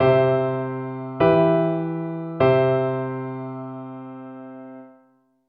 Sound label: Harmony I IIIm I